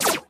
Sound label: Space Shot; doodle jump 2; android